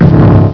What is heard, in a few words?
hit, shield